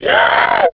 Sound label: zombie death; cstrike; jailbreak